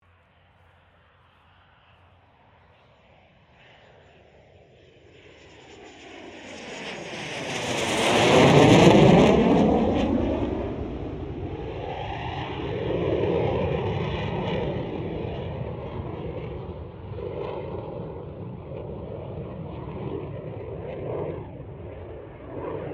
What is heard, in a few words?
AIRCRAFT CA-27 SABRE PASS STEREO; CA-27/F-86 Sabre; Airplanes; Vehicles; Aircraft Collection